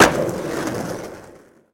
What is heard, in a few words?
Skateboard Olie End